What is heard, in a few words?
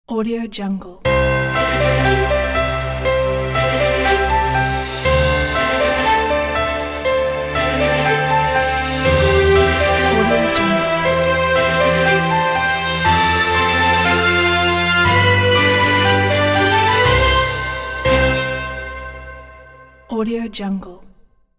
elegant orchestral logo